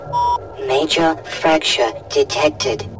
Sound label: major fracture